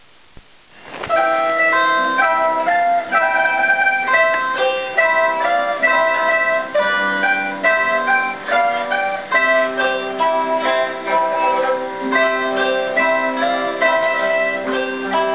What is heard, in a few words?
Zither